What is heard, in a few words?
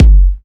kick f sharp key; kick drum samples; one shots